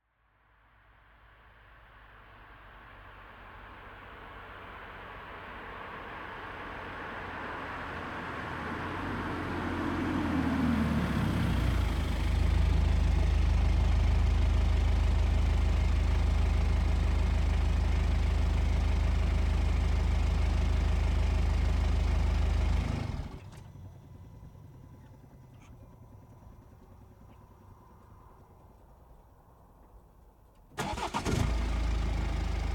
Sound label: BMW 420D Gran Coupe exterior engine stopping off on idle and drive away mono; NTG3